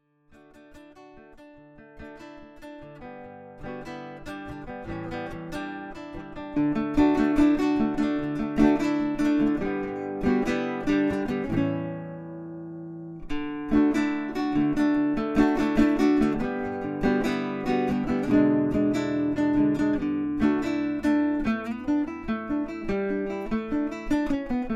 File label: Guitar Duo